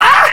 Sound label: pain; Soul Huntress